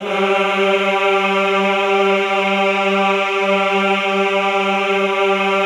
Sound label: EHH G2, Classical Choir; Best Service; Extended Classical Choir; EHH MIX